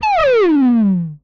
Beep

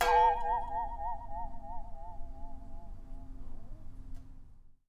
Wobble, Japanese Pull Saw

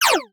synth laser; retro synth

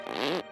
Fart; More Fart; Weird

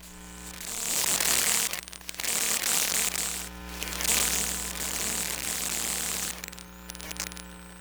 Computer Tower, Electromagnetic Sounds; Micro Pack; NazdyNate

